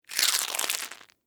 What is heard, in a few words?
Crunch Egg Shell; Texture; Vegetable; Crunches; Objects; BlastWave